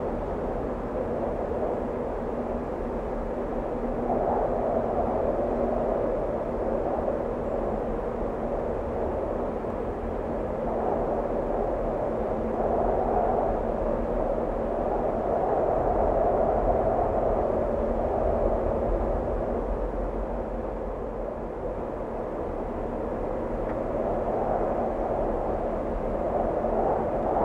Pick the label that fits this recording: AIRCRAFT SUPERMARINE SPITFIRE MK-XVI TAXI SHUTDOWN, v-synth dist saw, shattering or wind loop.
wind loop